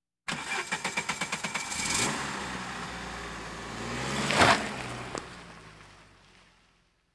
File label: Automobiles various